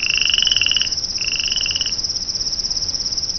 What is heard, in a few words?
cro night; HL1-Mecklenburg